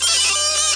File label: riff; oscar lemming